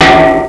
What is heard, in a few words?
METAL